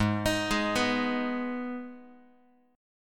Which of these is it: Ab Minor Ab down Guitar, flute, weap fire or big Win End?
Ab Minor Ab down Guitar